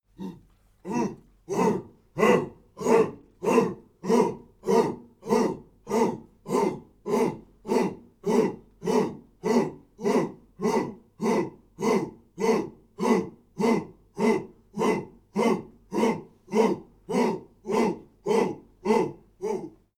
chants simple

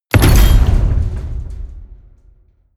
gate slam medium

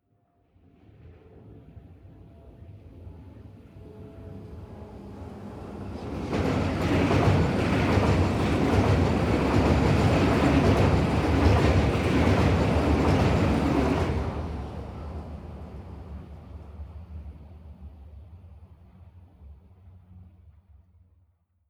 train passenger train drive by rattle

